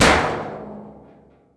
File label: OIL TANK; AMG - Vince Clarke Lucky Bastard